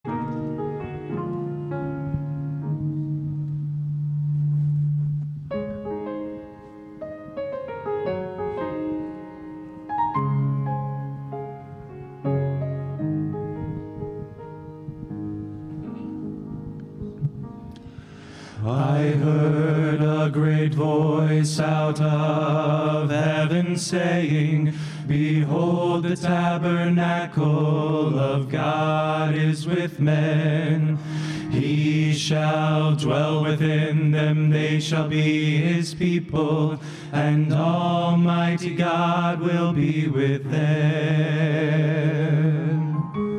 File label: quartet